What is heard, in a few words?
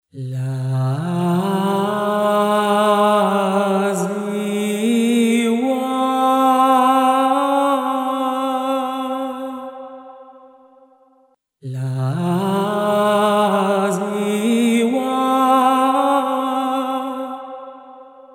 Lyrical Vocal Phrases Tempo 75 und 125 Prozent